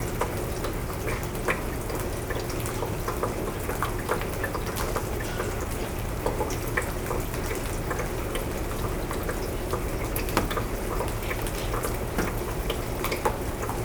Drops On The Back Porch, Field Recordings Oregon Rain; Omni Gardens